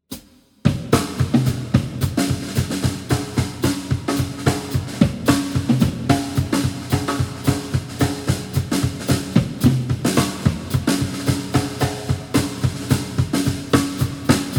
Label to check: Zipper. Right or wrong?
Wrong